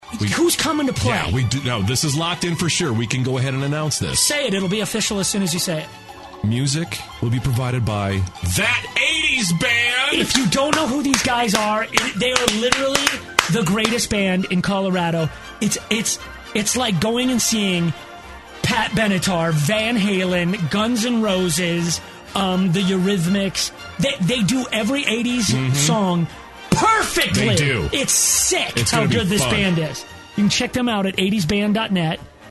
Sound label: Xmas; 80s Band